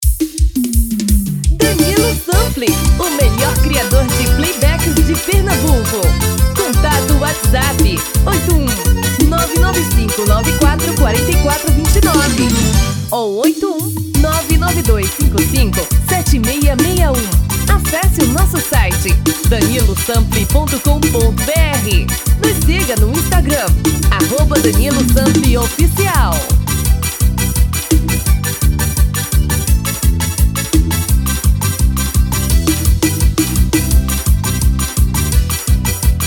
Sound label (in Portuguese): Arrasta pe